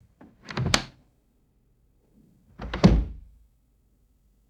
light wooden cupboard door opening and closing

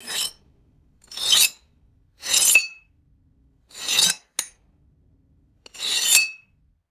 short high pitched glass scrapes

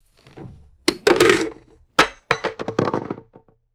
hollow plastic tube drops and bounces; foley

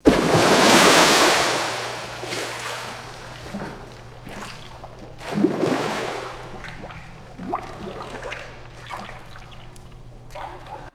heavy splash and churning pool water

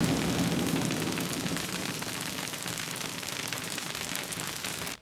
heavy rain pattering on canvas tent

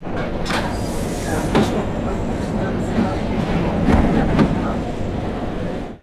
light rail train door sliding open; trains

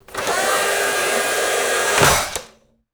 vacuum cleaner cable retracting and clunking; machines

